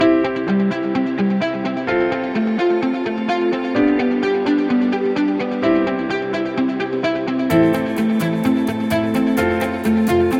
Epic Inspirational, corporate music; corporate music vol 16

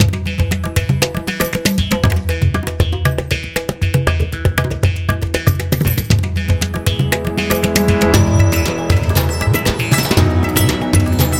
Business India

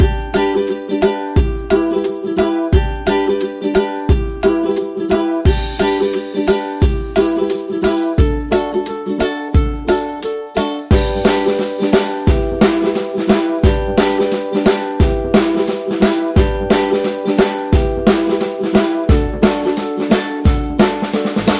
Positive and Upbeat

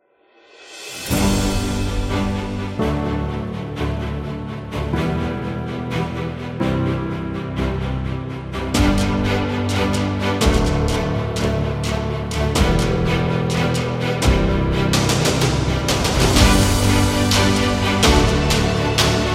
Motivate

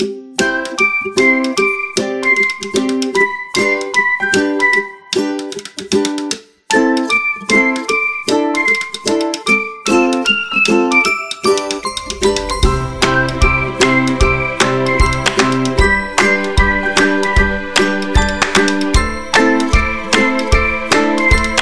Happy and Funny, happy music

